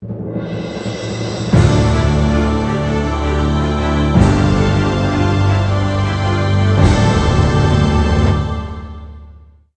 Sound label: music blowup; clan; PC Gamer IT CD 32; MECHCMDR